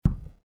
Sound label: stop, stall door; movers; doors; Soldier Of Fortune II Double Helix; XBOX